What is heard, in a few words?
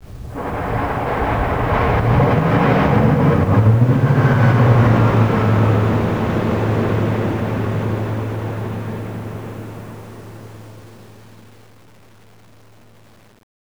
eng start, Train; OpenBVE; 55022 Royal Scots Grey